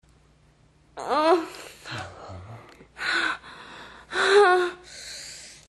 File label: moan; Low Blow